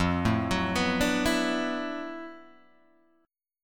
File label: D 6th Add 9th F, Guitar, Standard; 1,0,4,4,3,0; arpeggiated; JGuitar